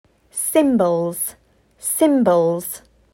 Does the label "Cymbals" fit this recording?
Yes